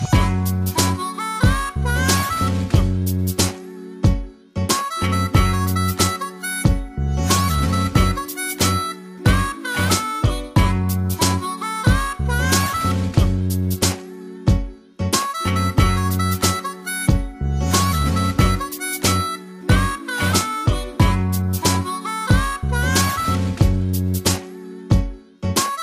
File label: Beautiful Harmonica